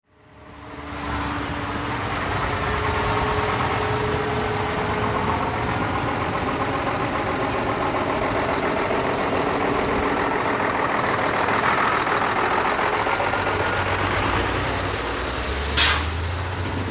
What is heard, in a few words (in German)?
umladen von roheisen